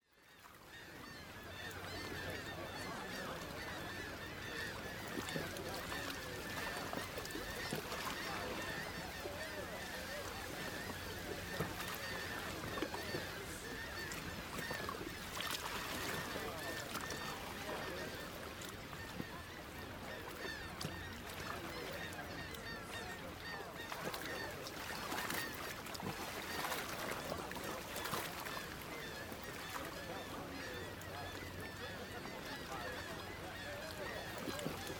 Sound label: Harbor; Age of Empires III